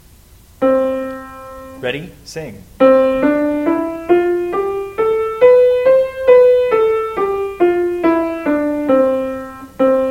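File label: C Major, scales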